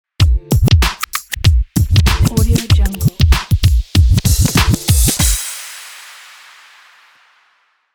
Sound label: hip hop scratch logo